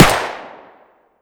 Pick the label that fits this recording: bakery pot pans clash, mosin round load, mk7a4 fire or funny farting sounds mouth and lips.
mk7a4 fire